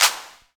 hand clap c key, hand clap sound tuned to the C note. Loudest frequency: 4704Hz; single hits one shots